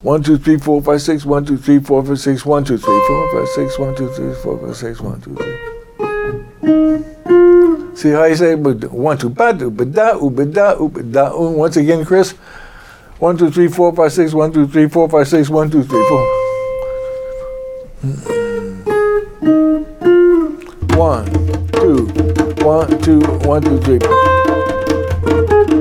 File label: accents on 3 and 6; jazz; Mike Longo